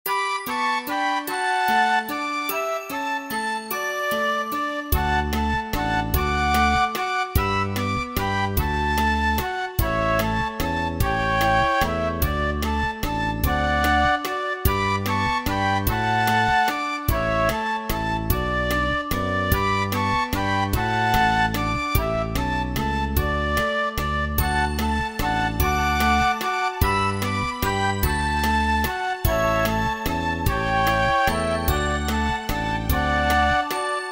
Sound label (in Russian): Polskaya narodnaya melodiya